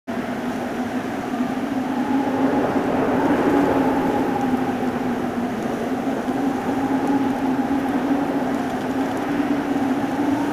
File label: Ambience (Cliff); donkey kong country returns 3ds wii wii u 2010